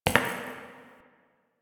Idle Single Step; Ambience; Warframe; Entrati; Tagfer

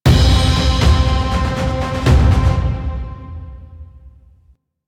End Stinger; Warframe; Kuva Lich; Kuva Lich Cin

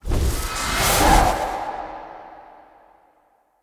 Rage Cast; Fey; Champion

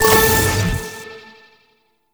Hack Minigame Click After Hold C; Duviri; Warframe

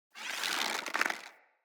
Hunter Bow Ranged Charge Variant; Weapons; Player; Bows; EnvoyHunterBowRangedMelee

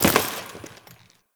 Follie Enemy Step; Warframe

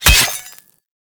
Melee Axe Impact Robotic Flesh; Axes

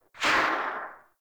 Inf Vomit Gun Tail; Infested; Weapons

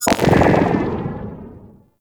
Operator Hand Fizzle; Focus; Madurai

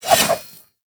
Spin Swing, Weapons; Warframe; Tenno; Revenant; Nikana

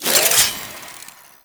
Finisher Parazon Equip; Kuva Lich; Warframe

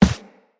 Run Default, Footsteps; Characters; Operator

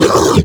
Flying Mouth Pain; Warframe; ManInTheWall

